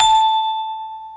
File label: Bell A5